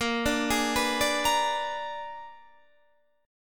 A sharp 7th Flat 9th, Guitar Open D chord

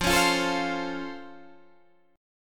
C sharp Augmented Major 7th F

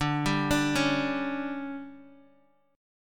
D Major 7th Suspended 4th, Guitar, DADGAD

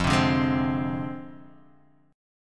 D-Minor 7th Flat 5th, F

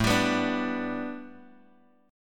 A 9th Suspended 4th Bass Standard, chord

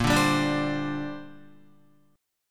A sharp 6th Add 9th, Guitar; A#6add9 strummed; JGuitar

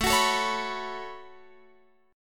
A 6th Add 9th, Guitar, Open F; A6add9 strummed; x,16,18,16,16,18

